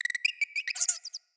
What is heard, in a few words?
Night Creature Close; Night One Offs; Ambience